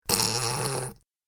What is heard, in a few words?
Stifled Laughter; Laughter Sound Effects